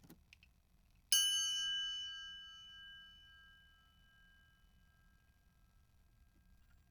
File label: triangle